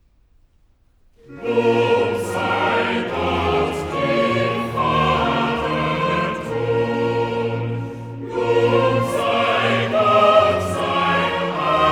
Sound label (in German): Choral; BWV 36 'Schwingt freudig euch empor'; Part 2, VIII. Choral 'Lob sei Gott, dem Vater, g'; Bach; Köln; 1st Sun in Advent; Cantata